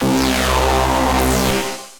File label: Diabolical Reso, Synth Lead; Ableton; Factory Packs; Punch and Tilt